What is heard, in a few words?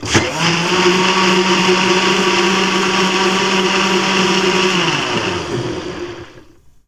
BLENDER RU, Kitchen Counter; SFX; E-MU Producer Series Vol. 2; More Studio Essentials